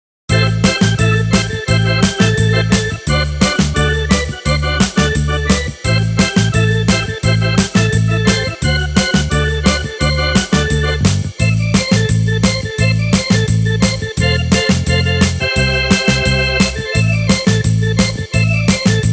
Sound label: Mellotron Micro Organ